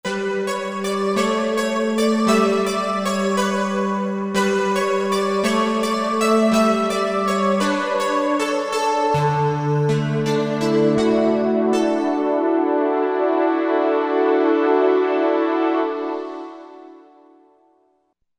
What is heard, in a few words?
2 voices; Korg MSC-12S Synth 3